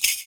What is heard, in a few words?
FOOT PERC; Univers Sons - Raricussions